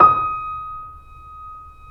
KEY, YC7; Rhythm Section

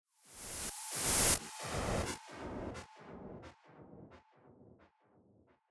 Swept Noise

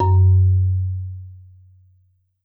F#1, Marimba

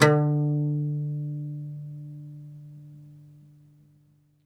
OUD D2, Plucked Strings; E-MU Producer Series Vol. 7 – Old World Instruments

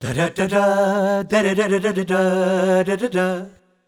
Bhangra, Vocals Male, World Pop; Sonic Foundry (Sony Creative Software)

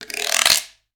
GUIRO LNG; ILIO; Synclavier Percussion 2; World & Orchestral

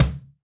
Cajon Bs; Roland SPD-S

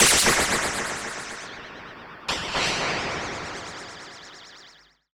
Ring Mod & Delay, contact mic Cymbal; musicradar; contact mic madness

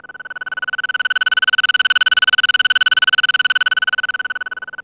rattle sweep; Zero-G Ambient 1; RATTLESWEEPS

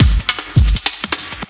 LOOP, Drum & Bass 160 BPM; Ueberschall; Drum & Bass Flash Trackz

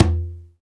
PRC CONGA, Roland; Northstar - Drumscapes Roland; Bonus Perc; Congas

